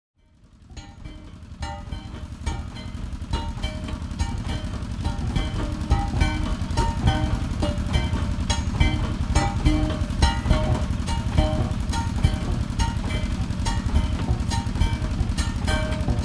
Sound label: Stone breaker, INDONESIA